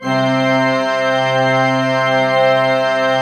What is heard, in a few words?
C3, Cathedral Organ; ILIO; Propeller Islands Cathedral Organ